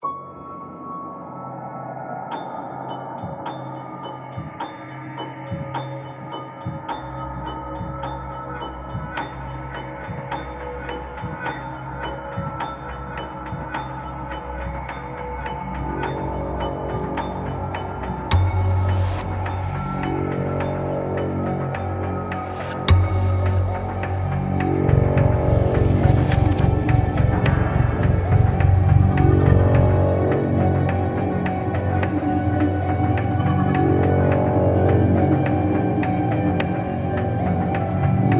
CAVERNE TRAP

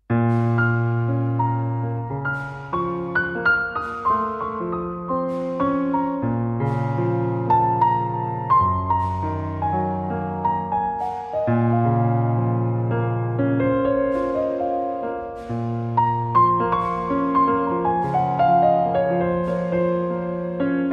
ROMANTIC BLUE KEYS

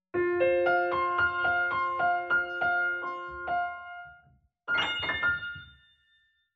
PIANO

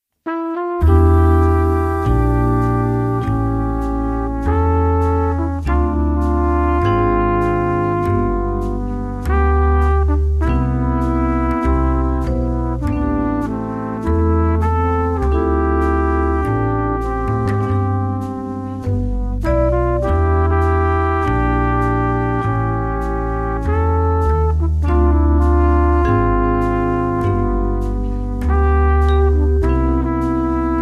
QUIET

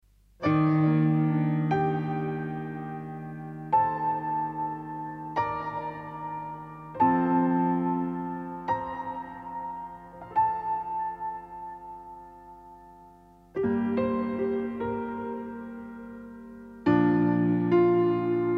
PIANO

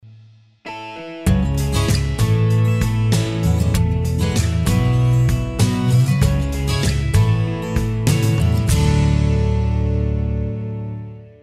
POP TRANSITION

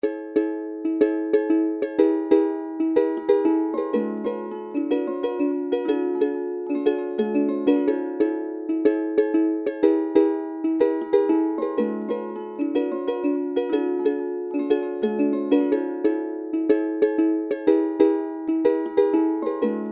UKULELE

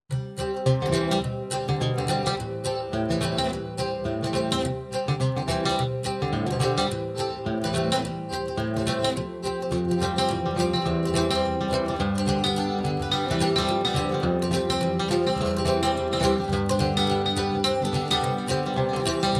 dizzy accoustic guitar

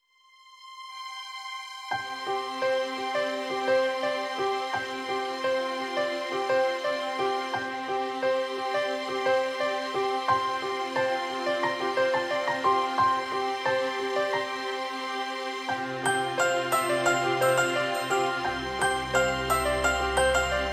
PIANO CORDE

